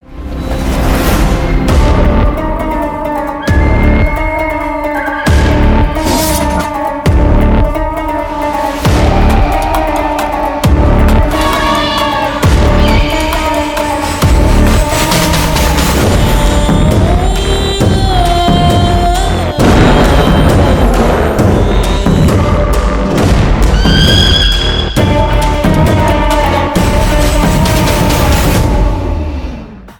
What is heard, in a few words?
Motion Poster BGM Ringtone; Suriya 42; Suriya, Disha Patani